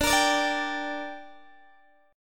D Suspended 4th Sharp 5th D down Guitar DADGAD, chord